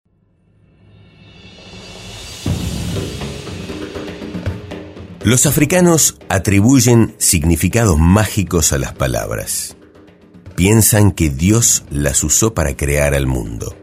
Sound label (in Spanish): tambores y griots